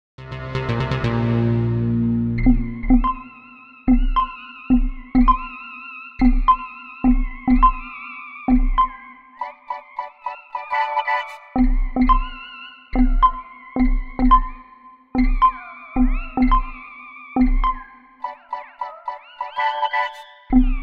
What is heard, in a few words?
MIDI ditty long; spellman studio